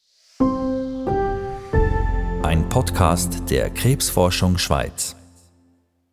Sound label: PODCAST JINGLE OPENER FULLMIX FX